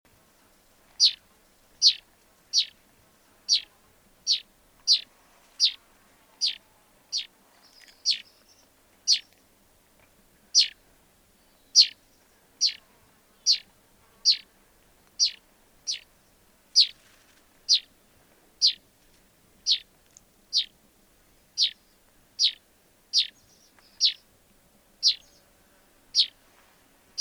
Finch Sound

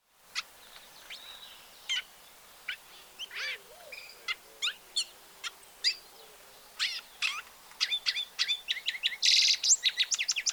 Siskin Sound

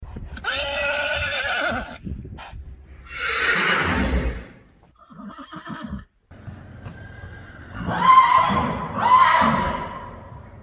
Majestic Horse Neigh

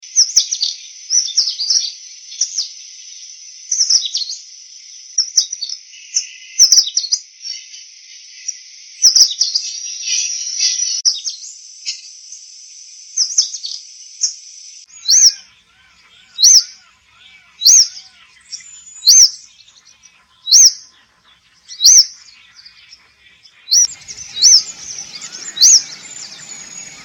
Indian Robin

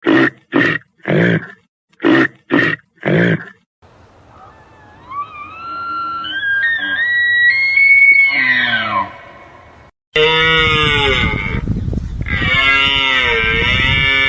Reindeer

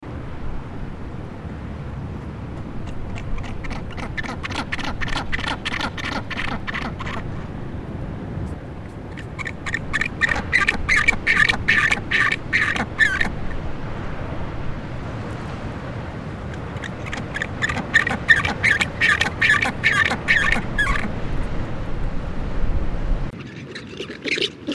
Penguin Sound

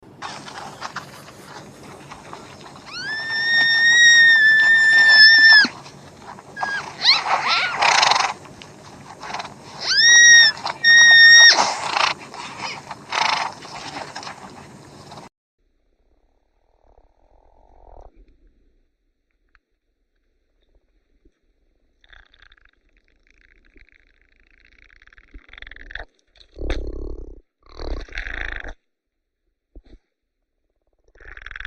Arabian Oryx Sound

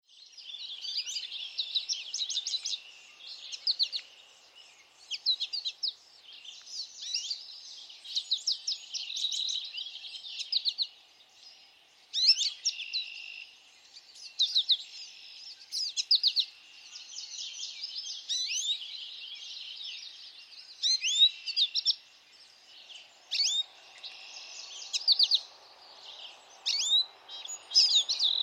American Goldfinch Sound

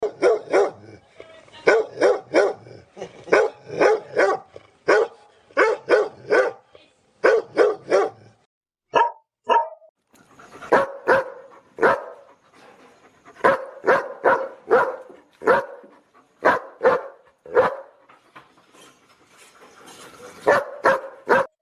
Happy Dog Barking

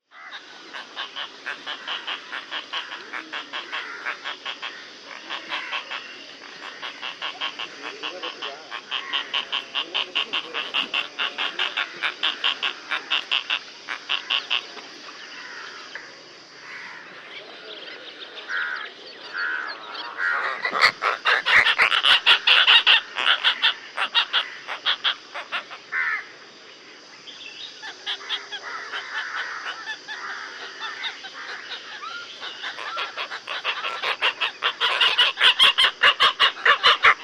Openbill Sound

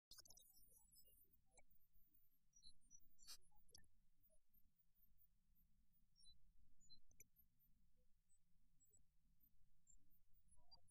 Grey Crowned Crane Sound

